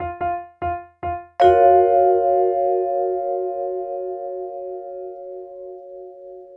Keys, Cinematic Funk Urban Tone Poems; Sonic Foundry (Sony Creative Software); Session 05 - The Triad

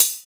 Hhat, HiHat; Equipped Music; Loungin House

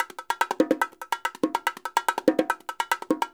144, Percussion works, LOOPS; Sampleheads; NYC Percussionworks; 90 s Sample CDs

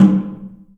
DRUM; The Piano; InVision Interactive

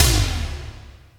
SYNTOM, DRUM; AKAI S-Series CD-ROM Sound Library VOL-2

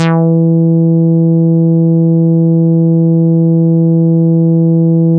bass, synths

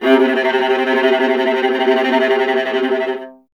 viola C; Miroslav Vitous; Solo Instruments VOL-1

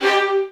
VN FX, Virtuoso Strings

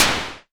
SNR, SCI Prophet-5 synth drums

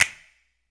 HMM, Human Perc, Body Perc; Spectrasonics; Supreme Beats; World Dance

